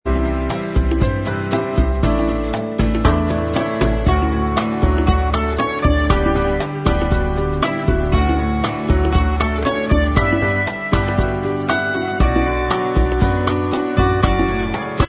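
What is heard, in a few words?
bossa version instrumental track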